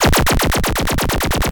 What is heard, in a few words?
Poli Effects; Stunn